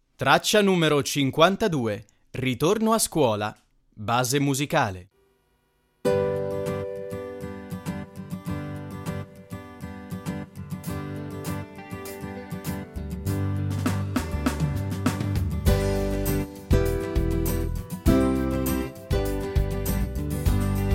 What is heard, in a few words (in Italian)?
ritorno a scuola base musicale; infanzia